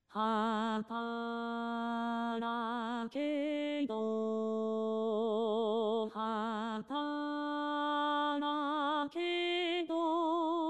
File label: alto only; 石川啄木